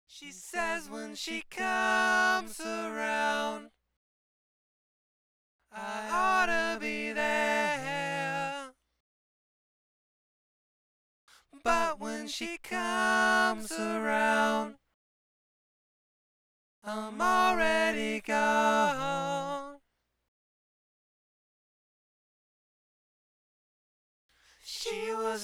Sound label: BGV; Pro Tools Essential Demos; Digidesign